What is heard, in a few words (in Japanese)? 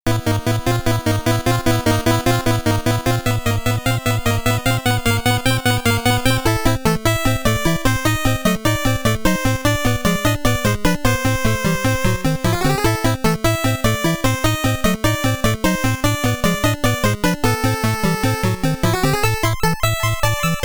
暗黒と緊迫 (沼地ステージ); Castlevania III: Dracula's Curse